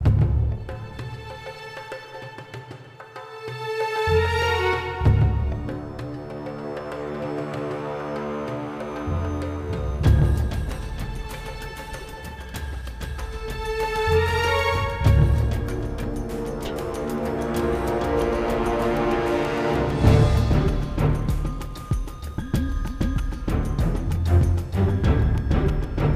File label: 96 Bpm Full; Incredible Hulk The Ultimate Destruction; playstation 2